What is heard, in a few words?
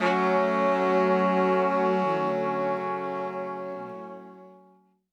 Soul Horns Ending, 70s Analog Funk; Sonic Foundry (Sony Creative Software); What it Is!